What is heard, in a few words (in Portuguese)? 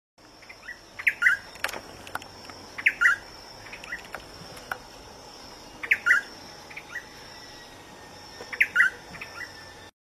Canto do garrinchao de barriga vermelha